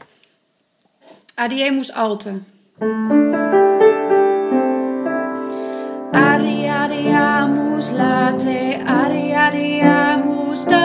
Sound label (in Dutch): 2e Sopraan